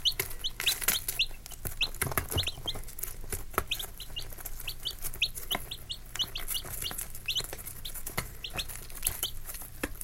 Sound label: chicks